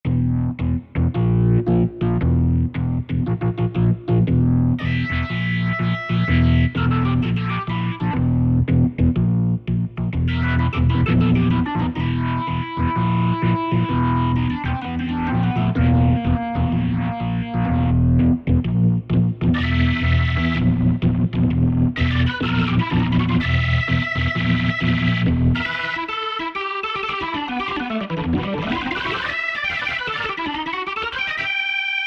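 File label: Organ Tube Crunch, Yamaha EX5R; Factory presets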